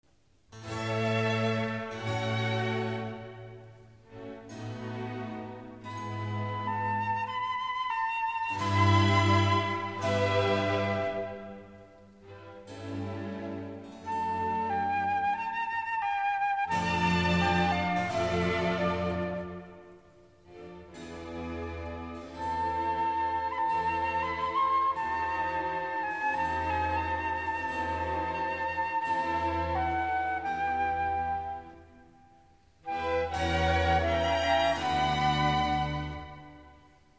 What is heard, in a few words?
Trumpet; Alessandro Scarlatti; Sinfonia n.2